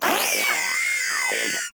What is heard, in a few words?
Proto Infested Maggot Bark; Warframe